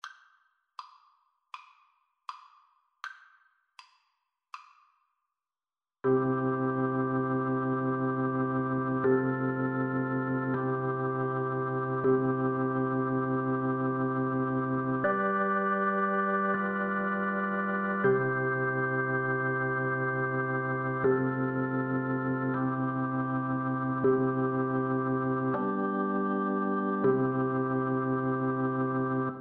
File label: swing low, Flute